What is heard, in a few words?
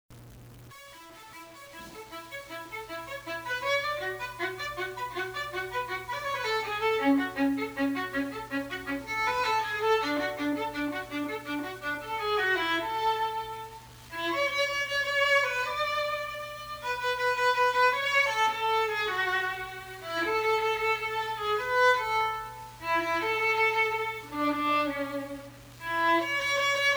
solo